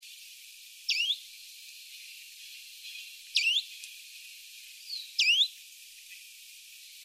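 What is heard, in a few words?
Phylloscopus proregulus, Kiina; Call, May 2002, Happy Island, Hebei China; Phylloscopus warblers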